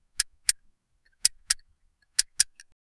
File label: the click of a seatbelt; buckle